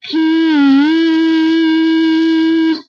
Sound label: Train Whistle, retro arcade; MMRetroArcadeSoundsPack; Vehicles